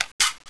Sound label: scout clip out, weapons; cstrike